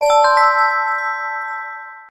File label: Christmas ding